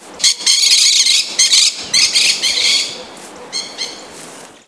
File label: Pyrrhura frontalis; Pq Caracol; Canela RS; Silveira